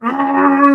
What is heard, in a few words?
weng weng weng